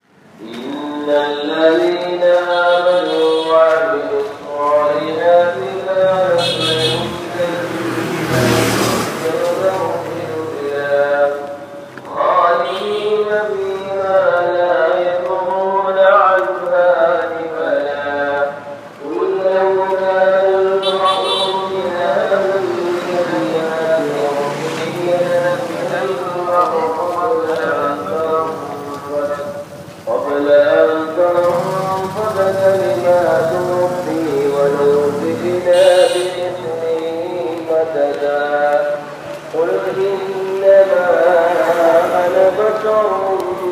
Mosque and Traffic; Kochi; Religious Music; Samuel Corwin; Selected Field Recordings from India and Nepal

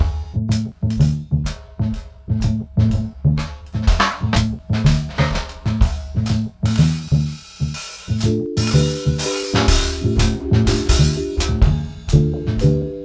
Jazz Solo

